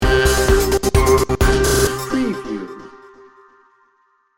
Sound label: energy flow sting bumper